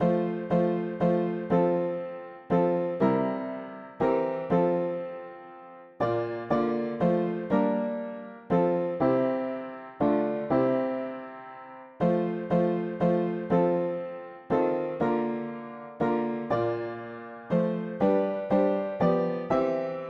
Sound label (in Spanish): mono piano; hymns; Himnario pequeno